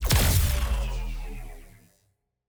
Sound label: Emmiter Crystal Power Off; TANK; 3Souls